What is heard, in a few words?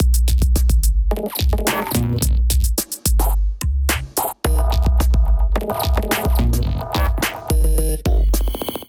Token Kit groove 108 bpm; MIDI Clips; 89 - 120 bpm; Ableton; Glitch and Wash